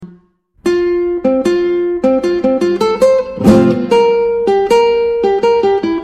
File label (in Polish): Gitara